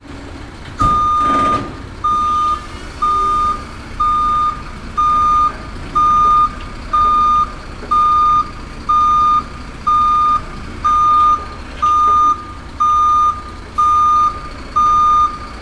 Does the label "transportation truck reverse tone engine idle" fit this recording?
Yes